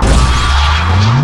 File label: suicide charging; uwc3x